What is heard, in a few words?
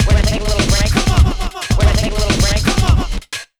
RUN 140, Big Beat Drumloops